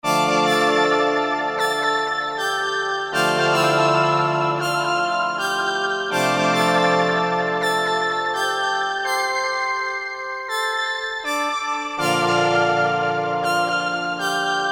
AMBIENT, Metal, Korg DSS-1; Metal-Metalism

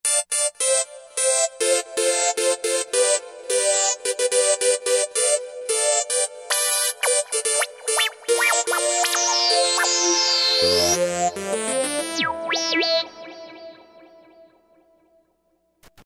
80's Memory, Roland SRX-05 Supreme Dance

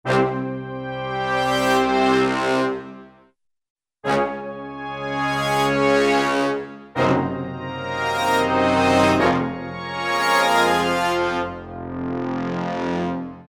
BRS Ensembl; Brass And Woodwinds; Roland L-CDX-03

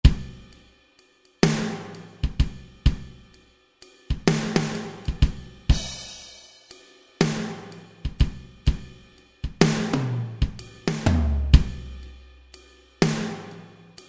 Big Ballad KIT; Roland SR-JV80-10 Bass & Drums

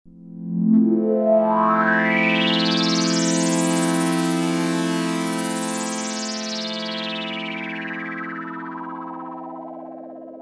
DELAY MOD, Oberheim OB-8; Factory Patches

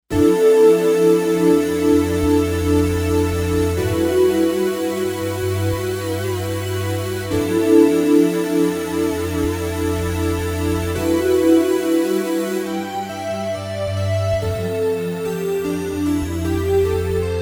Ice Dust, Kawai K5000S factory presets

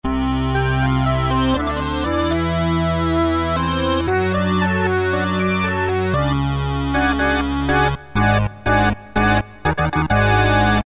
Organ, Roland Alpha Juno-2; Factory B

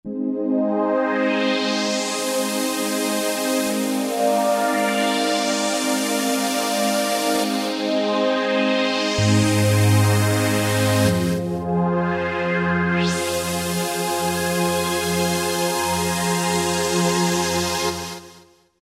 Choir Sweep; Roland SRX-05 Supreme Dance

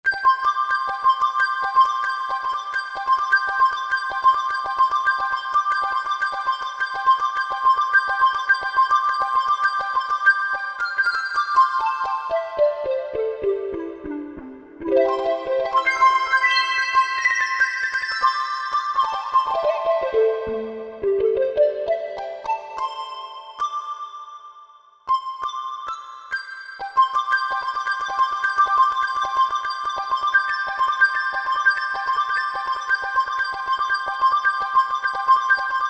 Kyrie Seq., Korg MSC-6S; Fretted Insts 1

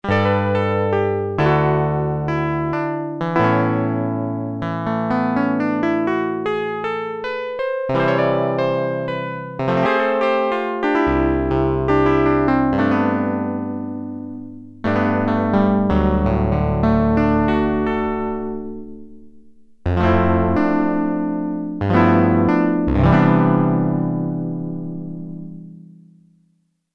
Electric Piano II; Sequential Circuits Prophet-5 (Ver.3); Factory Patch; File 2 Programs

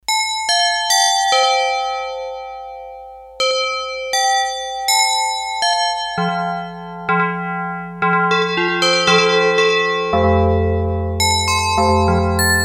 Bells, Korg DS-8; Factory Patches

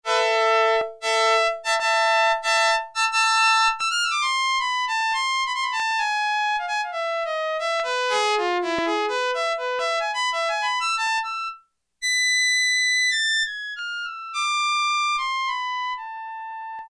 Solo Violin, Yamaha TX81Z

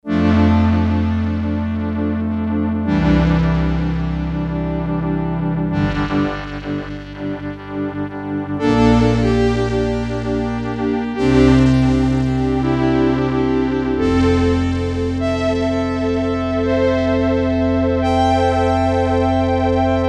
Analog Pad, Korg DS-8; Factory Patches

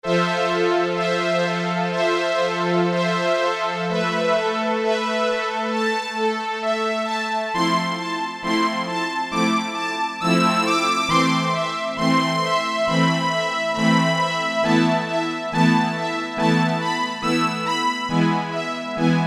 Strings, DWGS synthesizer presets; Korg DSS-1; Korg DSS-1 Sound Library

